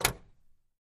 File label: Camaro Door Close Lig, chevy camaro